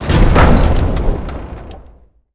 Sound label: Gate Close, mine; KuberaMine